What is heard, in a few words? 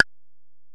Ac Perc, Drum Machines Alesis DM-PRO